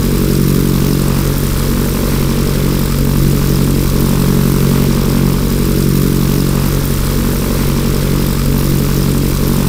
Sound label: Aircrafts Engines Rolls Royce Merlin Prop; DCS